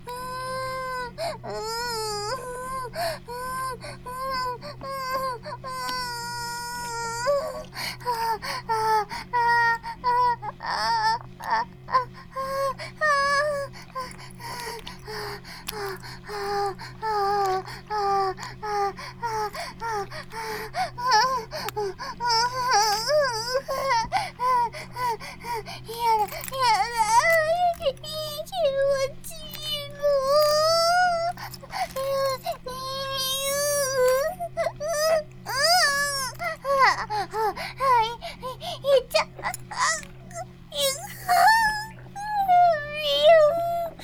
バイブ放置; イクたびにわけて

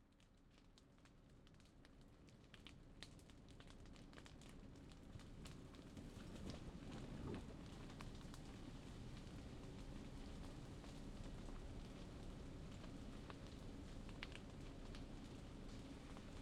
火鉢で炙りもの（火鉢、網焼き、サザエ酒、晩酌) ASMR

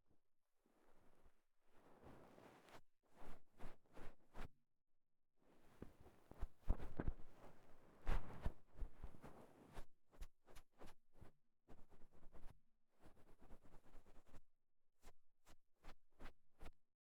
梵天, 耳かきに関する音; KU100

